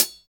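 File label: Dixie hi hat half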